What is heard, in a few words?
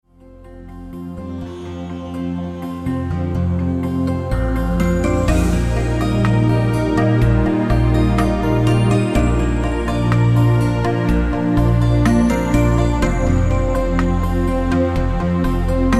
Vibrations